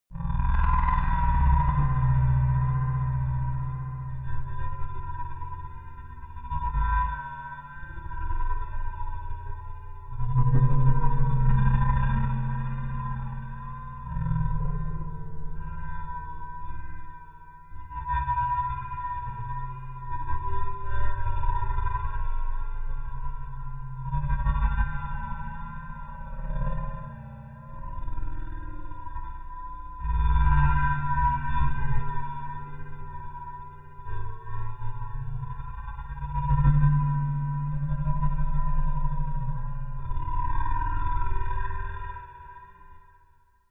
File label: bowed metal